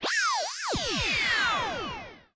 spiderboss dead

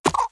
Enemy Poult Walk

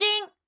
Head Bounce; Jinn

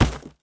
Monkey; rabbids multiverse; iOS, macOS, Mobile

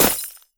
Ice Spell Impact Hit Shard

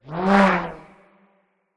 Tennis; Sfx Tennis

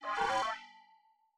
voice Combo Warning; aquariumboss; enemies

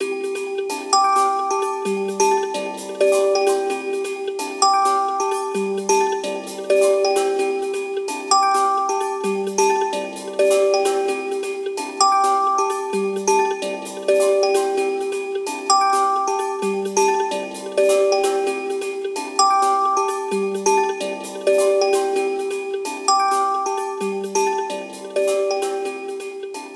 Pluck; Sandwalkers

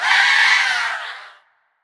Feminine Death

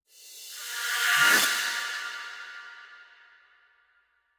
Battle To Main Menu Transition; Pokémon Trading Card Game Live

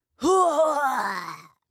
Ruins Flying Sentry Death

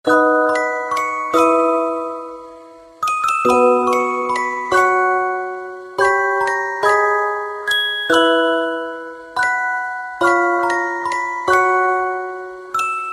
Lullaby Song; menherafflesia flowering abyss; android

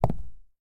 Footsteps Concrete Shoes; FX Oneshot; Starbites; PS5, Steam, Switch, Switch 2, Windows, Xbox Series X/S

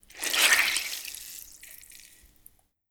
Sponge Squeeze

